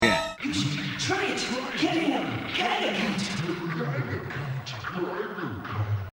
Geiger

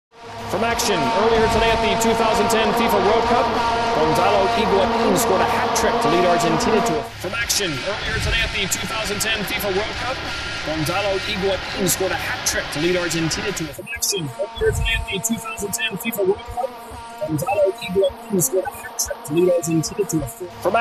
vuvuzela examples

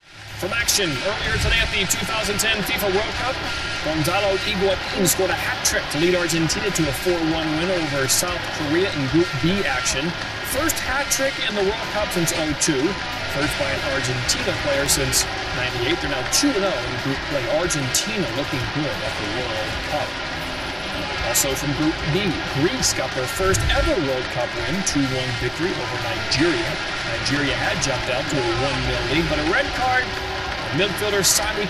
Vuvuzela filter

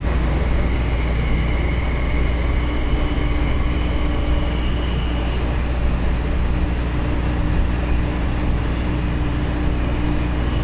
Trolley run full speed

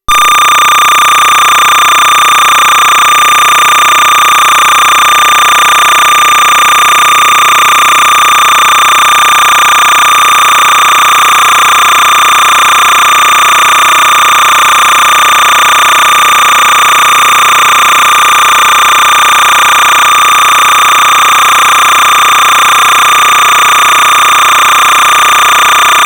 smpte

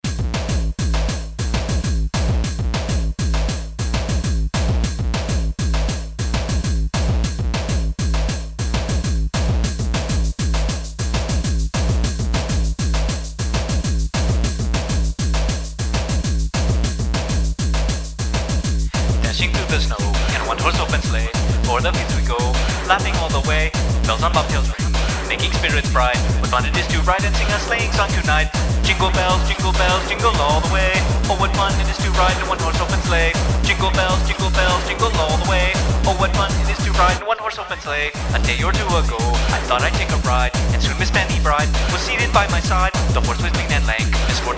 Danish Jingle Bells

